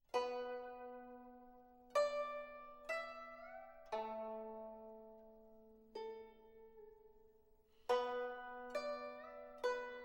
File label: Tuba; Movement II - I. Fisherman's Song at Dusk